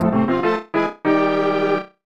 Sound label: Tada; Heisei Shin Onigashima; snes